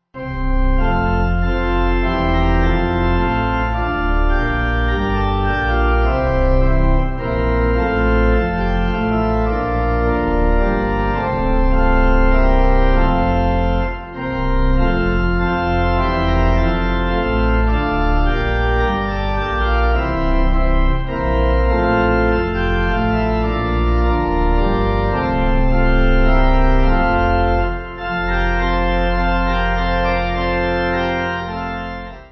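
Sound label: Pipe Organ, Music Backing Files for Churches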